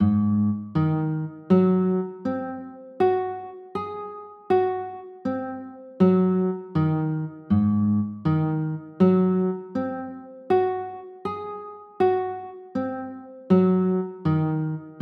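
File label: G sharp 7 chord, guitar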